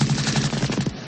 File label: machine gun sound; sfx